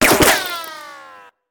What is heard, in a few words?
Bullet Ricochet; Sound Ideas; Guns